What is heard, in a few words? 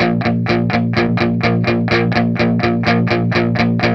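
125, Vintage Blues Guitar rhythm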